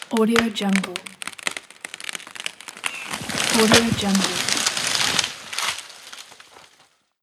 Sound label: tree cracking and falling